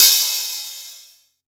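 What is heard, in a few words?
ambient hi-hat open, GM drum set; AKAI S-Series CD-ROM Sound Library VOL-8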